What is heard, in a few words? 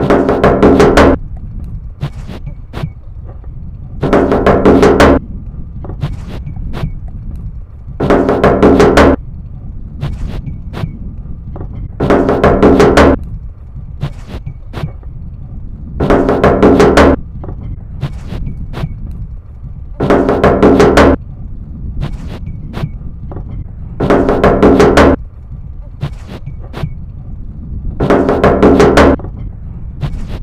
beat; Binckhorst; The neighborhood as a concert hall; Location:Binckhorstlaan